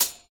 UI Click open; Puzzle Chronicles; PS3; PSP; X360

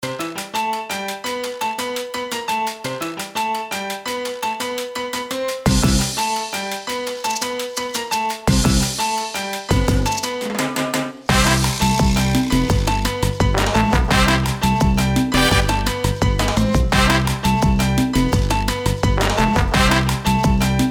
Salsa Short; cars mater national championship 2007 xbox 360 gamerip

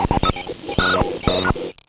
Weird Noise; Five Nights at Freddy's; FNAF Sister Location

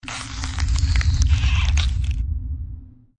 Rumble cold

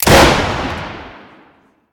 Gun Fire Outside; Devour; The Town

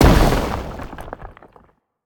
projectile

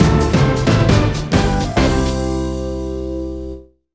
Goal; Face Pilot

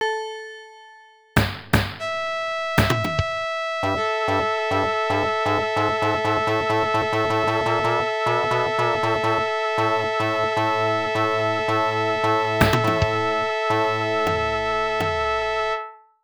Tuning

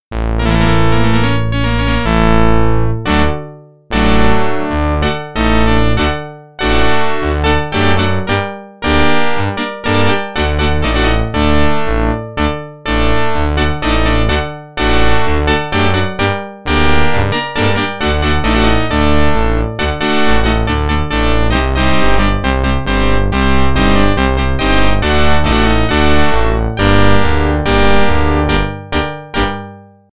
Organ; NHL 94

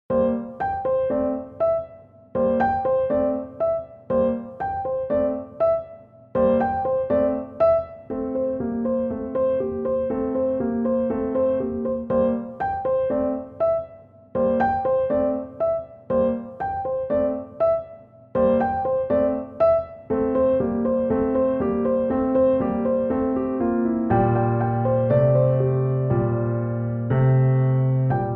The Islander's Xylophone

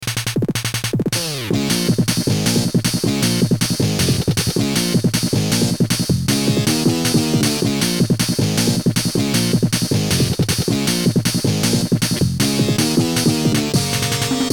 Metal; Animal Crossing Wild World

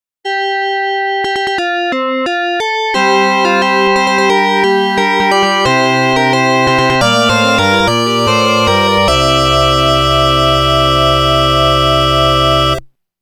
fanfaare; Marchen Veil

